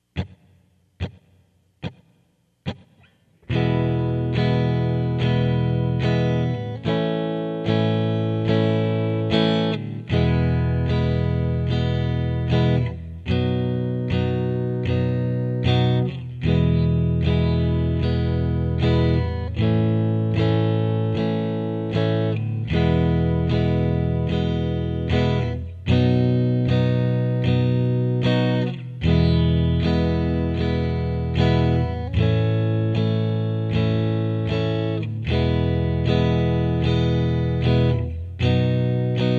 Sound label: low e, guitar